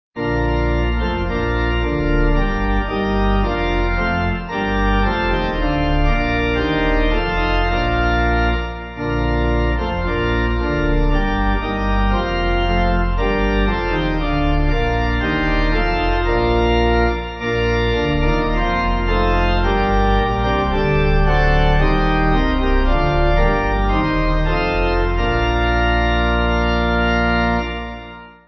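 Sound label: Pipe, Pipe Organ backing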